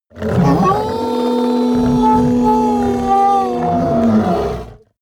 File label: hellhound howl long; kreaturen